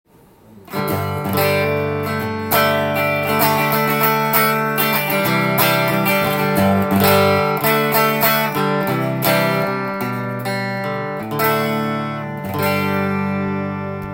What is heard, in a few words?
fender american standard, guitar; diy american standard fender 99